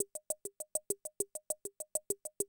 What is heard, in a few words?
CR78, DrumLoops